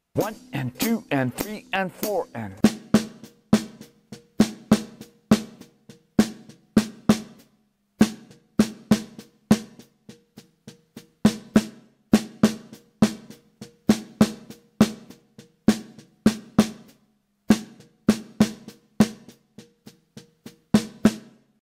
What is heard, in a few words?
Melody Straight 8ths